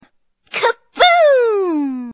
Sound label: kaboom; tristana